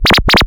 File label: SCRACH; CMP - Analogon